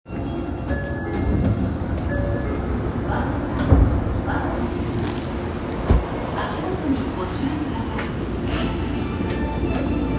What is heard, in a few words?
train sounds; 東西線早稲田東